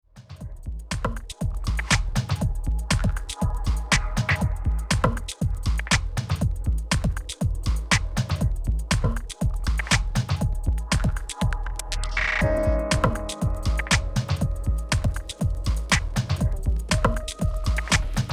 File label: Korg Kaoss Replay Song Mode